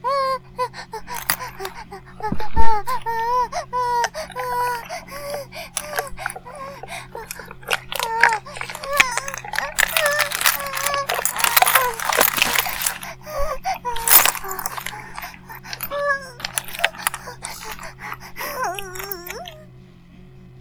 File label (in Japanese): バイブ放置; イクたびにわけて